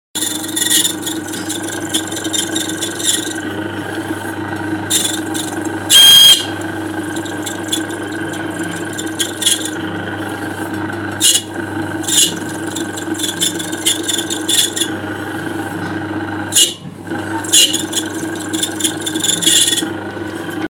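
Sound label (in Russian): звук производства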